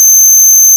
post eq blit constant filter

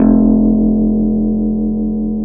ebass va

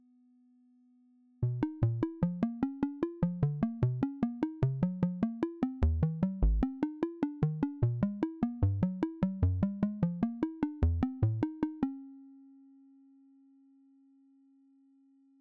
LPG both square

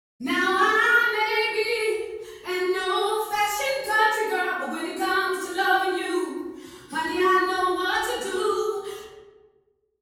singing

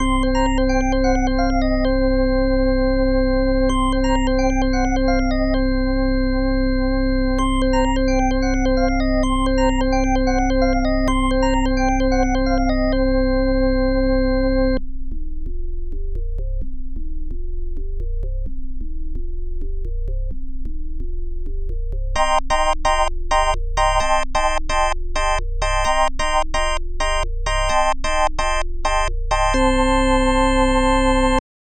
organ leslie slow, hammond

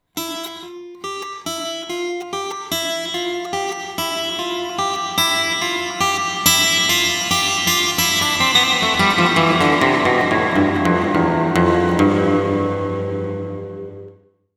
Plucking Point Estimation Example Output

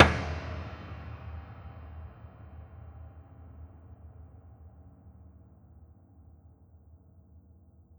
knock Resynth; knocks; pedal

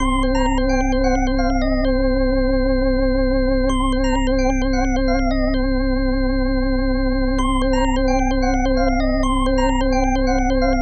organ leslie fast, hammond

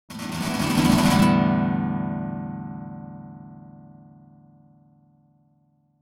rasg roll synthesis

